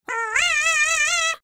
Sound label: scream; Bouncy Balls 2; yellow